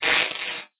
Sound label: tear